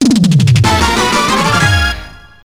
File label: Checkpoint; backfire; arcade